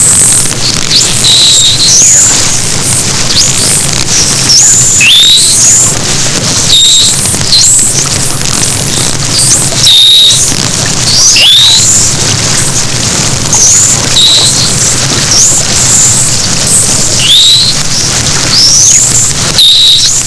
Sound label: P crassidens